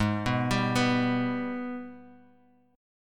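Ab Minor Major 7th chord, Guitar, Half Step Down tuning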